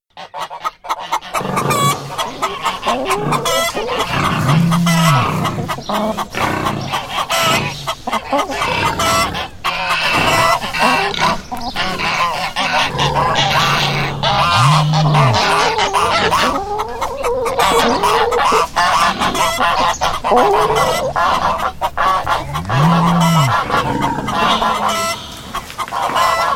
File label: Very noisy farm with many animals; Very noisy farm with many animals. Can you spot them all ?; Farm Animal Madness; Farms